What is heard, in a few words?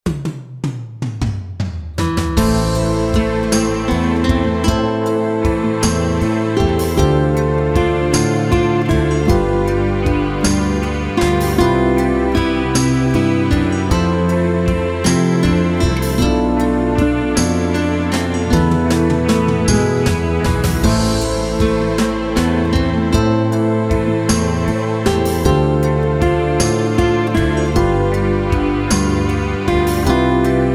classic rock